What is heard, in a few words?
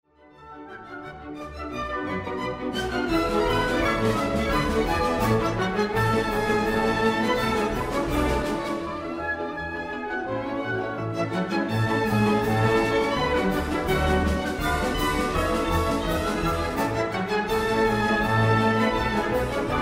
Polka; Carl Michael Ziehrer